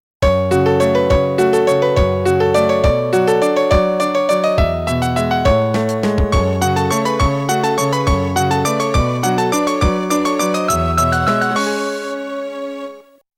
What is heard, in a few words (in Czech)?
melodie